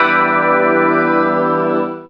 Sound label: synth e, Techno Chords